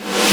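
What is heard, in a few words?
snare reverse, DnB Breakz Kit; Breaks N Beats Sound Kit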